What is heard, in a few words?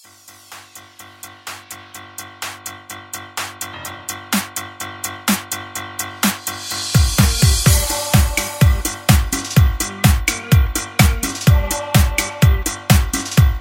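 Instrumental with background vocals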